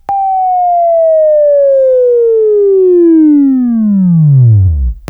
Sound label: WAV Left, Sweeping; Labview Program; Sweeping Training program